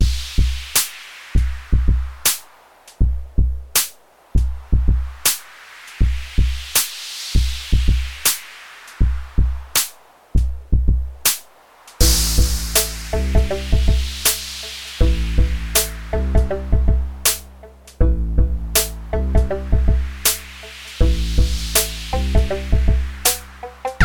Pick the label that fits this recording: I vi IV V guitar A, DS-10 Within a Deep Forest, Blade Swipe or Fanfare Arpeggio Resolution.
DS-10 Within a Deep Forest